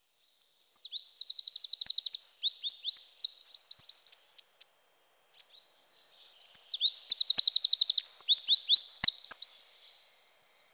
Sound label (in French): Troglodyte mignon